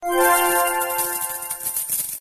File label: high win